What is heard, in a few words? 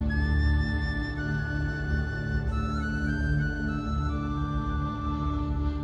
flute solo